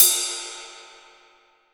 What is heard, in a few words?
Cymbals